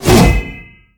attack

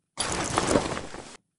box in, weapons

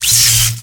lightning impact

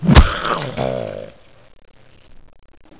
zombie attack

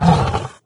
melee, doom3 pinky

